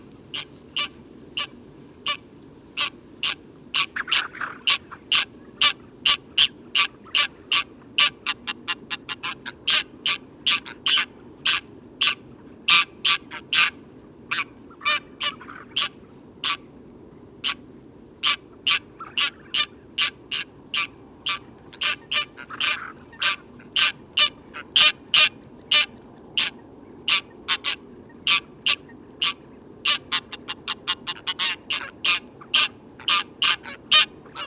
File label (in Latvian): meža zoss anser anser; Greylag Goose (Anser anser); putni liepajas ezera zirgu sala